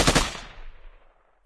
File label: Heavy atk, 攻击音效; 重机枪; 攻击音效 atk 初级及以上形态攻击音效; 重机枪手; 海岛奇兵; 他会使用手中的重机枪攻击对手